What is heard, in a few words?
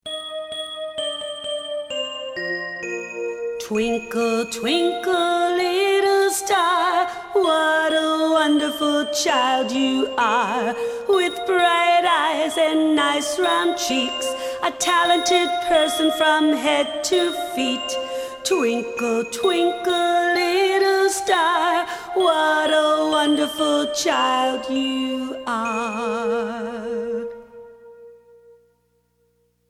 Twinkle Twinkle Little Star